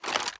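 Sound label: inspect movement, weapons; Valorant; cstrike; bucky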